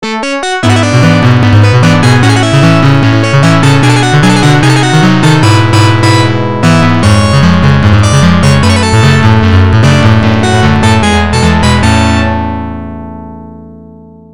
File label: Stage Clear; gun.smoke arcade